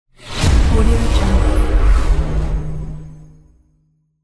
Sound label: futuristic door